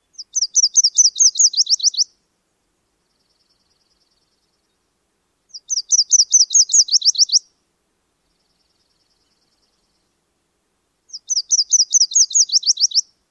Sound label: Myrtle song